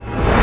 Flashback

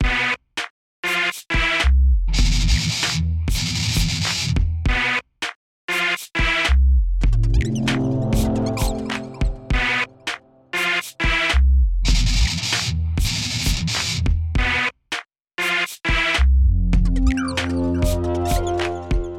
Broken Beat

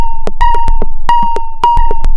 110 Bpm Fm Rhythm

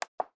Plastic Button On Off Remote

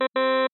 Error Signal

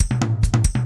Drum Loops

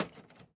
Axe Barrel

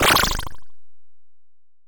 Alien Sound 8 Bit

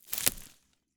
Plant Harvest

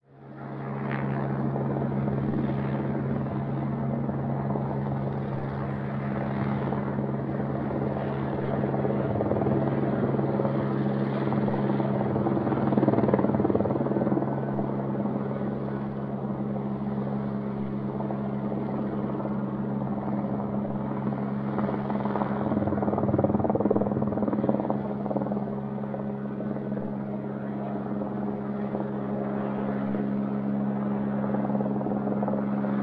Helicopter Overhead

